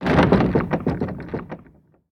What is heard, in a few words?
Door Rattling; The 39 Steps